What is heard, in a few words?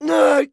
hit; Escape from Tarkov; scav2